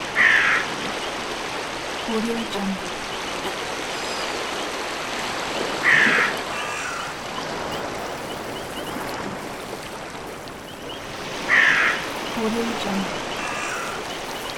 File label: sea ambience with birds